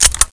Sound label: colt hammer back, weapons; dod